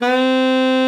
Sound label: C4, alto sax; Xsample VOL-2